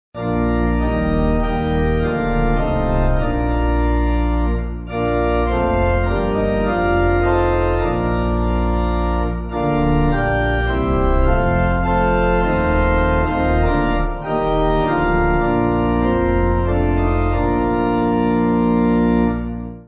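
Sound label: Pipe Organ; Lord Bless And Pity Us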